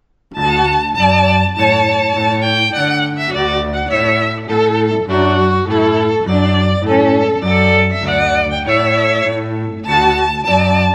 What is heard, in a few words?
trumpet tune, wedding music; Trumpet Tune, Purcell; Bridal Party or Bridal Processional